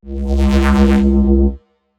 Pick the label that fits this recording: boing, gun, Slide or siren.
Slide